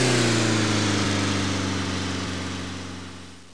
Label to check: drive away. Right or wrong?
Right